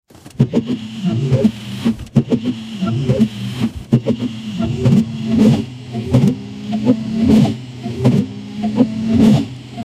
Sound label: heavy downpour on car; constant